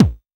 MIX BD, AKAI S6000, DRUM RYTHM; AKAI S6000 CD-ROM - Volume 1

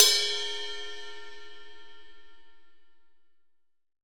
JAZZ RIDE; AKAI S-Series CD-ROM Sound Library VOL-3

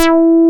JNO SPIK E5, SPIKE BS

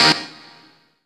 SYN REVYGUI, dance synth; Sound & Vision - Gigapack I CD 2 (Roland)

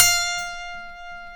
G, funk guitars

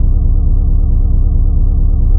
Drone Static; Drums & Drones

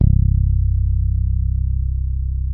bass, sustain; Sampleheads; Will Lee Bass Library

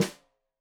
Snare Stereo, Drums; Deeply Sampled Drums; SMDrum

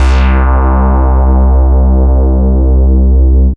Single Electric Basses, Dance Floor Bass

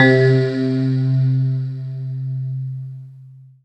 SYN SY-77, Roland, digital; Sound & Vision - Gigapack I CD 2

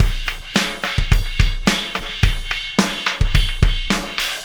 Oscillation Beat; Sonic Foundry (Sony Creative Software); Processed Drumkits Zero Gravity Beats; Oscillations

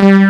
D SAW BASS; Pro-Rec - Synthsonic; TRINITY

